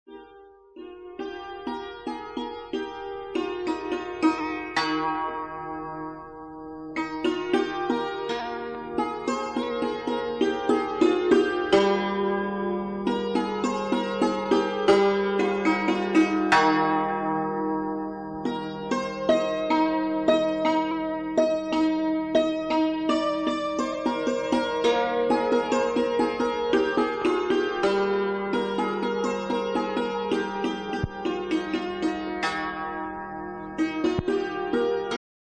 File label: santoor, kashmiri sufi music